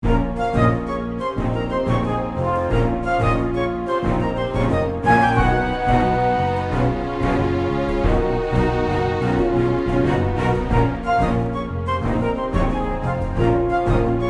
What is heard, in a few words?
Menu Music; Combat Card Game